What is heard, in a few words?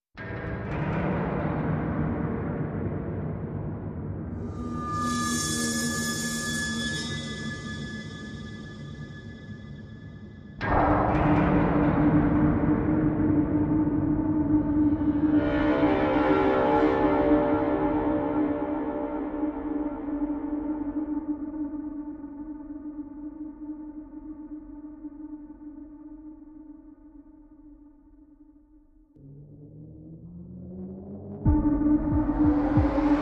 No Percussion